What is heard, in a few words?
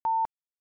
Censor Beep, Short; Short Electronic Beep To Censor Out Objectionable Words In Tv Broadcast; Multimedia